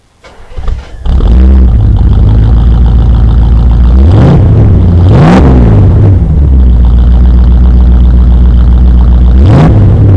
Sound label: Corvette L82, chevrolet